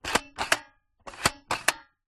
leisure toy stereoscope plastic lever press down change slide